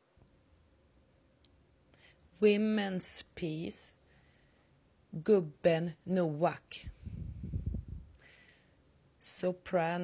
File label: Soprano; Gubben Noak